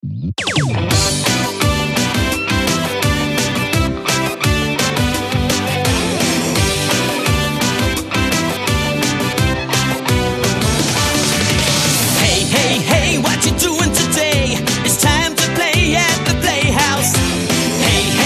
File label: Kids Gameshow; Play House